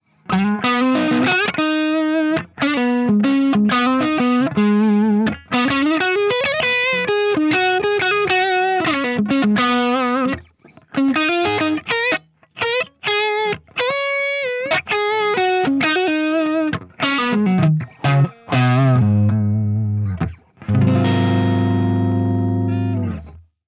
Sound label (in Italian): SB Les Paul Neck Pickup